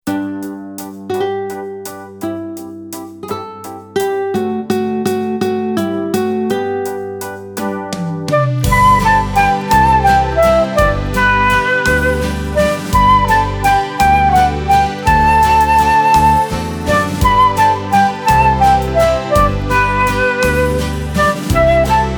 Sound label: Flute to listen; My Bonnie is over the Ocean